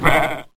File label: sheep baa